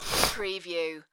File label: One sniff